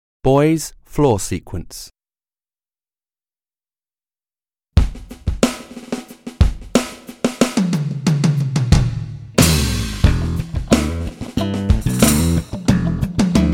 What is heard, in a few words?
Boys Floor Sequence